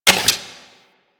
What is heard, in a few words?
Fist Movement; Enemies; DE; Warframe; Tyl Regor; Fists